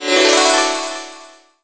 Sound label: MENU PUSH START